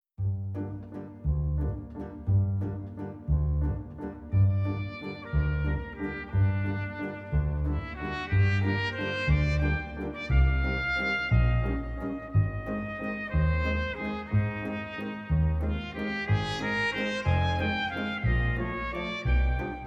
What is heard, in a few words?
Jazz Orchestra Waltz